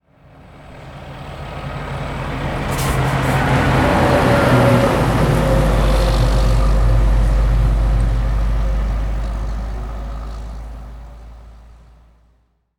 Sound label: truck pass by slow; transportation